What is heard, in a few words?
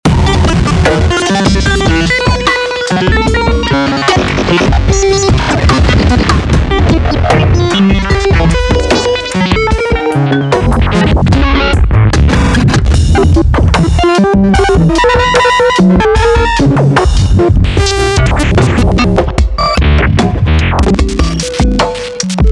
Microfreak / SB Drumcomputer / Arturia Tape Mello-Fi (+ Ableton resample/pitch tweaks)